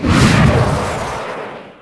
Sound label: fire; 年兽军队; 1-5波; 双角仙; 更新0.9.5.0-贺岁版